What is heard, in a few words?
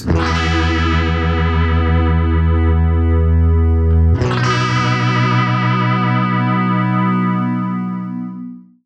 Chorus Envelope Rate Control; electro-harmonix eddy